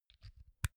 insert mini jack phone; objekte; elektronik

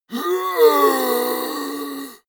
monster growl hoarse; comedy-cartoon; soundpool

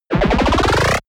laser gun energy charge; krieg-waffen; soundpool

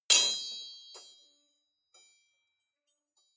metal impact bar ring rattle long; industrie-wirtschaft

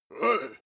male shout hit weak; menschen; koerpergeraeusche

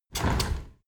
window handle squeak turn open heavy; objekte; fenster

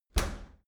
dry cleaner open, maschinen tueren

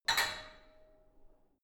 barbell only lift up hard, fitnessstudio

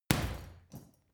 boxing punching bag hit hard; sport-freizeit; boxen

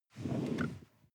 sports car int seat slide; verkehr transport; autos

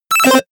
notification bleep

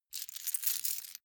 key ring large rattle; objekte; schluessel

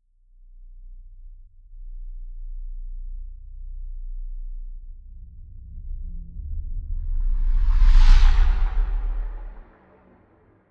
spaceship small flyby bright; raumschiff

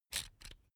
electric drill template adjust regular; soundpool

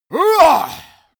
monster growl attack throaty; schreie

